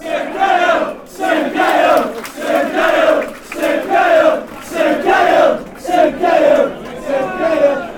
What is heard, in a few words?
chant; everton